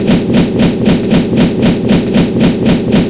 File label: fan